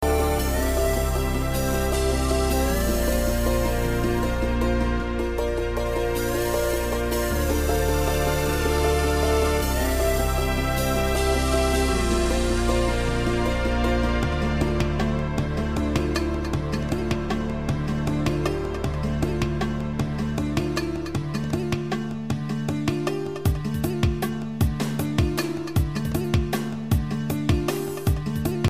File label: country indian ringtone